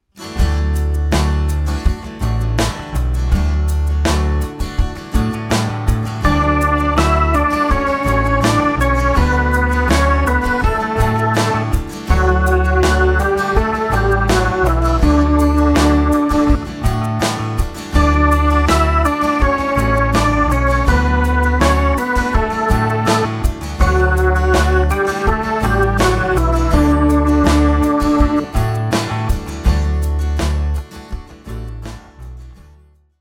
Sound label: melodic dictation